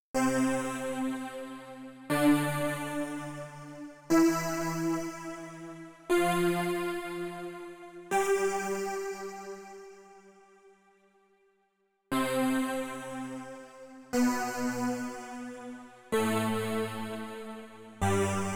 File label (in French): gamme